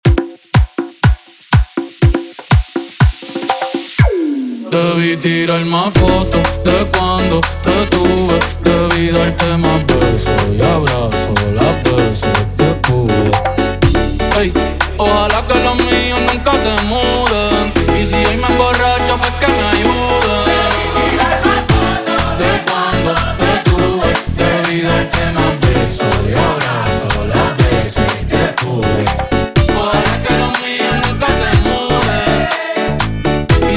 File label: intro 122 bpm